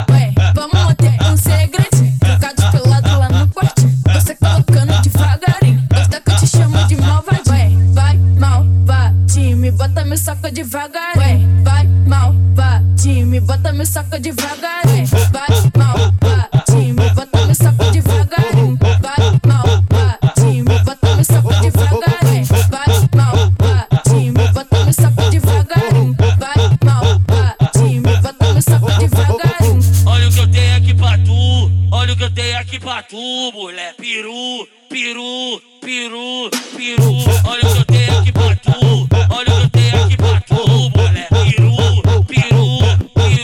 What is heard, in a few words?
funk bass